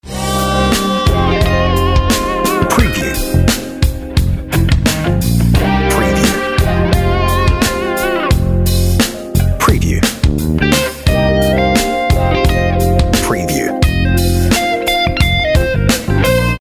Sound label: On Hold Music